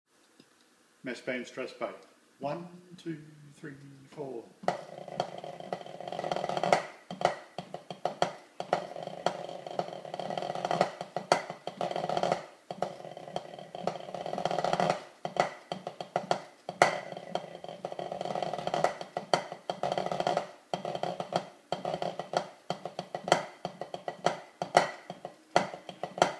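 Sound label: Massed Band Strathspey, pipe band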